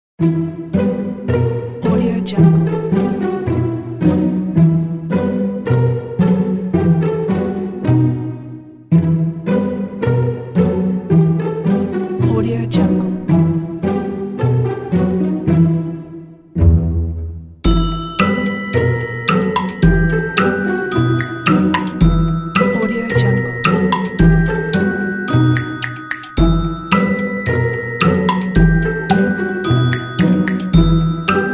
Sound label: sneaky; commercialmusic